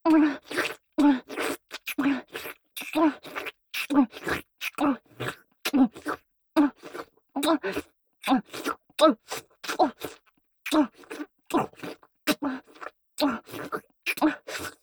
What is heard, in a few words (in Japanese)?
フェラ咥え